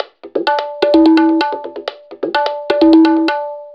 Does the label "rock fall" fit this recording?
No